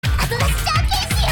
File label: hard big horse snort; mixkit